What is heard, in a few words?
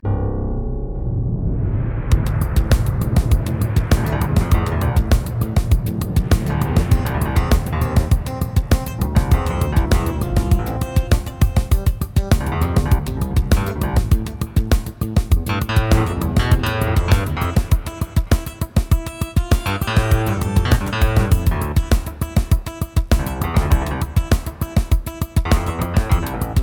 RAIN WASH; blackout